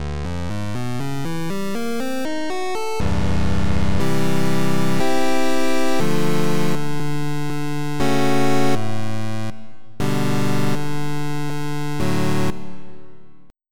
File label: Pulse; Onj's Soundfont